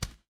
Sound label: retro game hit